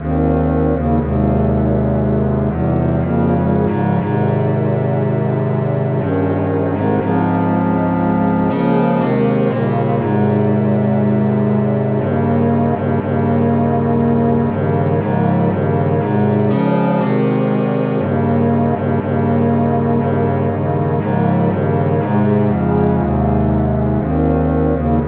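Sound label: traditional key C sharp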